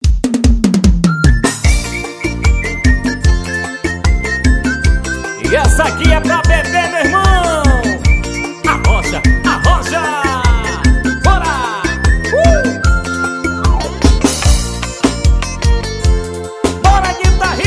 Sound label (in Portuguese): forro, locutor